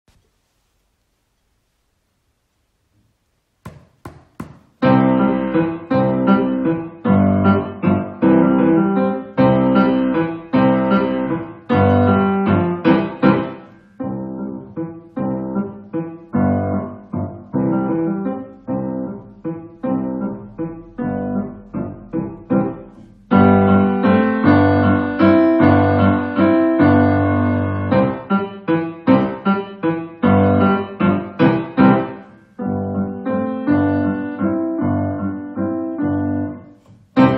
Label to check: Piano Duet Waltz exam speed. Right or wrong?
Right